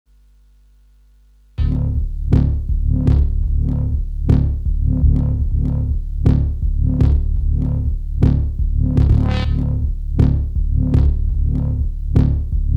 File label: S100 122 Dirty River Bass